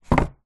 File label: foley pizza box set down wood table; zapsplat